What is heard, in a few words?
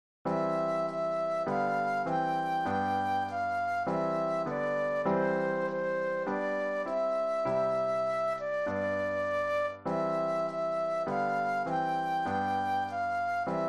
Flute Solo; classical